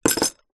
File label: household broken ceramic cup with peices inside down on table; zapsplat